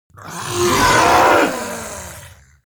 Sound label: creature monster scream